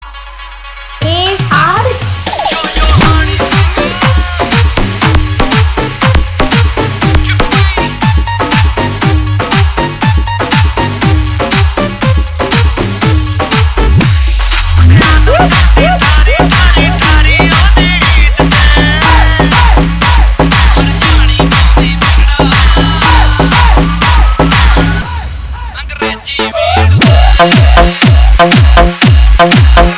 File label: DJ Remix Hard Bass